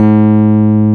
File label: KEY MKS20 E, MKS20 + EP